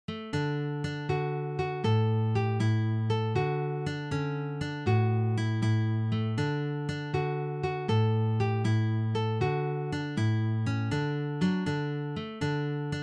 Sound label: tarantella; carulli